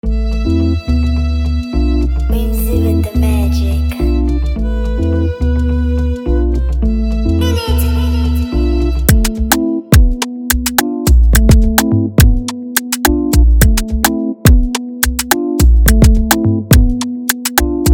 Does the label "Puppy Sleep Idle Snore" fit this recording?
No